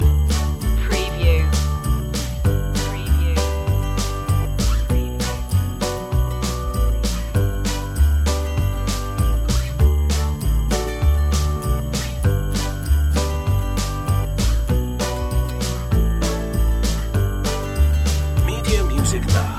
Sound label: soulful christmas loop; Christmas / Original Composition